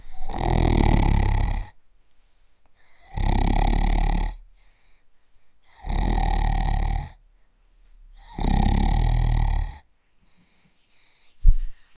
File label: 5 years old boy, bedroom